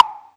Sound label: Wood Block Vinyl; DMX; One Shots; Drums